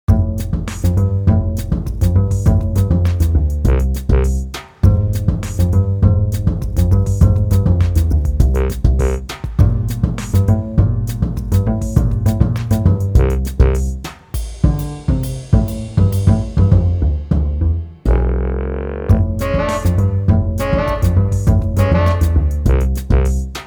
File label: JAZZ NEWS